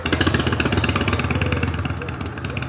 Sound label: harley